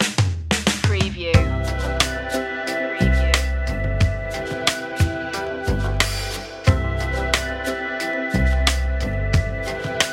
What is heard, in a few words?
chill electro